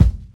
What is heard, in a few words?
warm sounding bass drum single shot b key